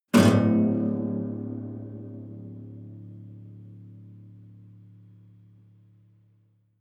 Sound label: low string buzz sustained